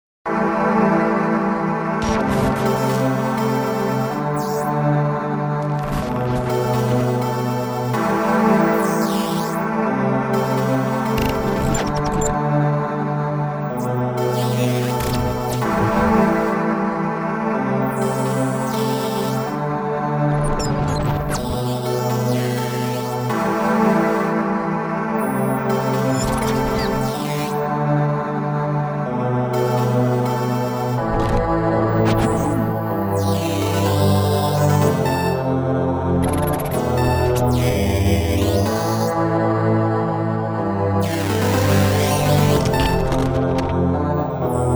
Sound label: 32-bit; Lenna's Inception; Glitch City